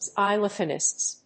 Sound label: xylophonist